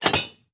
锻造台使用声

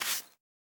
刷子刷扫声

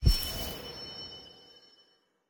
试炼刷怪笼开始生成物品声

